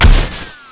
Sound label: portal, weapons; quake2